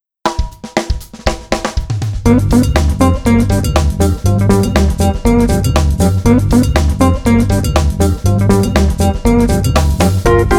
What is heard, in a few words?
Blues Rock Funky Instr 120